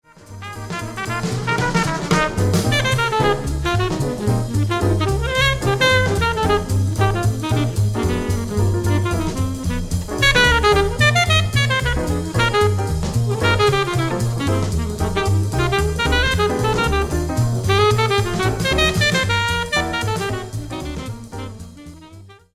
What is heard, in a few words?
solo